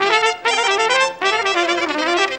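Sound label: clarinet trumpet; Best Service; World Colours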